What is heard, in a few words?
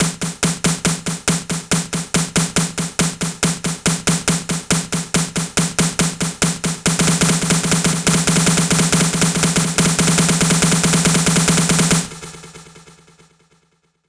140BPM SNARE 8Bars; Vengeance Samples; Snares&FX Pairs